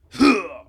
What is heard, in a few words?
Nailmaster Long Roar